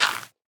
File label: composter fill success; minecraft; block